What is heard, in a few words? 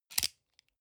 sunglasses plastic grab medium